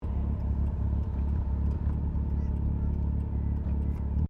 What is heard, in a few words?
CAR MAZDA RX7 SERIES3 INTERIOR IDLE INDICATORS